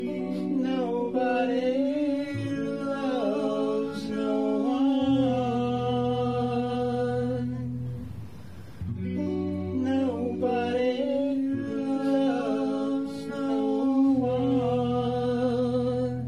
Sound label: Harmonizing End, Harmonies Vocals